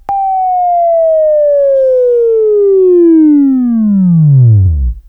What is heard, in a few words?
Buzz, Sweeping Training